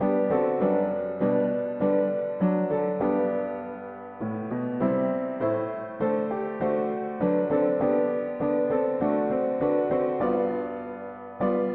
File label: mono piano; hymns